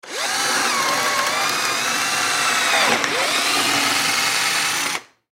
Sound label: Drill Electric Into Wood; Drill Electric 18-Volt Turn On Drill Into Wood Turn Off; Drills; Blastwave